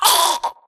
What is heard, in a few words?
grunt death neck; Titanfall 2